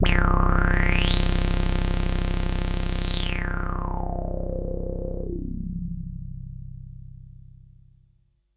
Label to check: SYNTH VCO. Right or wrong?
Right